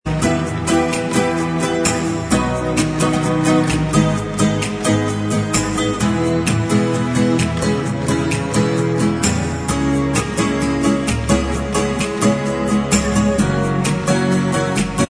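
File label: acoustic version instrumental, backing track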